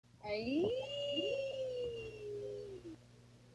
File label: ayiiii